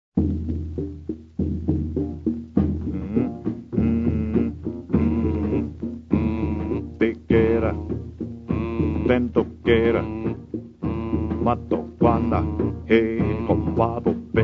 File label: African Jive